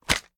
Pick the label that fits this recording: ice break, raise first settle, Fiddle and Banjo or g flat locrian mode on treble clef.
raise first settle